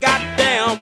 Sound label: god damn SUNG